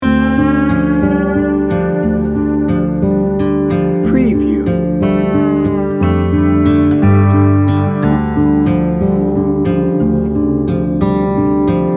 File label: dark places loop